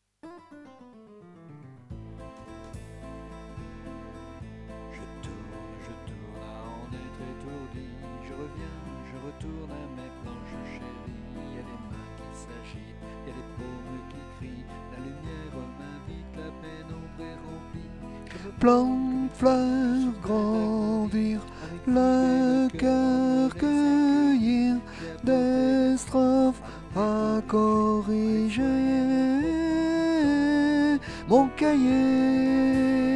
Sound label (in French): Je tourne tenor